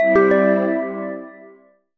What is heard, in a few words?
Notify